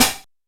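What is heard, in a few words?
shaker metal; HIP HOP; Pro-Rec - Synthsonic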